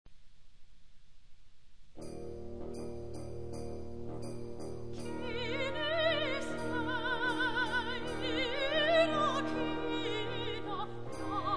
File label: Five Folk Songs, wind band